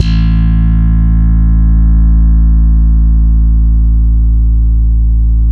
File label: bass, A 2; Spectrasonics; Bass Legends CD 1